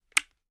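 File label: weapon selector off; gmod; foley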